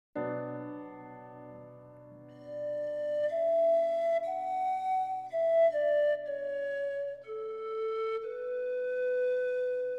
Sonatina Adagio molto poco rubato, Pan flute music